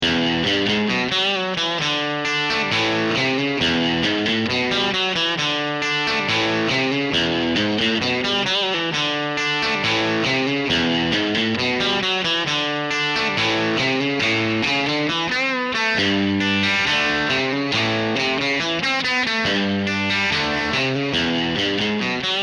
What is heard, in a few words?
Voxman Tone Lab